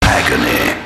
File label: agony